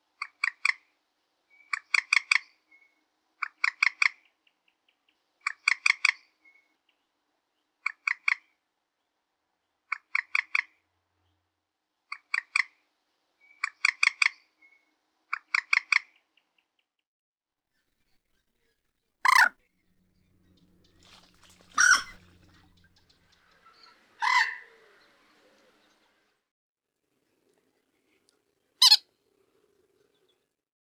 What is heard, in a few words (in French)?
Poule d'eau